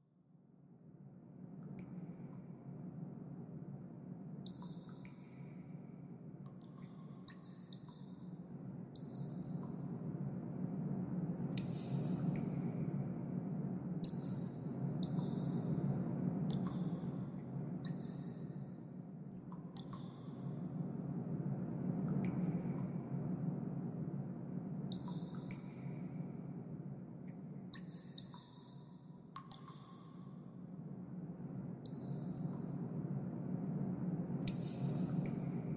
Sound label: Generic Ambient; Junk Jack